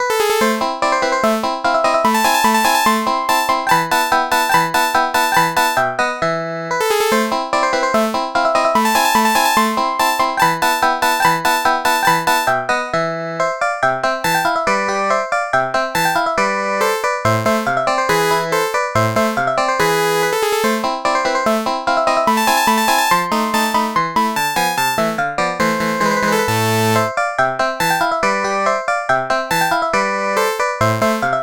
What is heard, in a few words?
charang